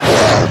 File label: Monster Attack; Monster George